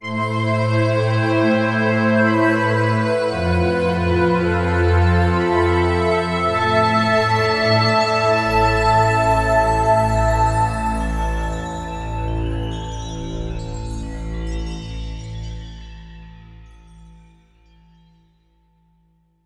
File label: Signature Sound; FXHome; Signature Tracks